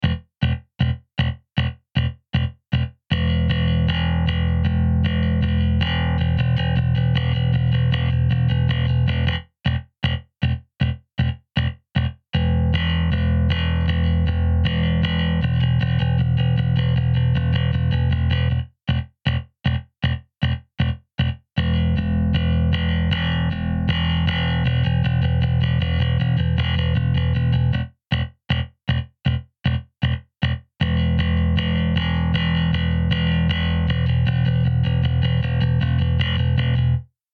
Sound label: Clear Bass